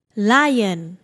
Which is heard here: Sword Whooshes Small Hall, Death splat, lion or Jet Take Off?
lion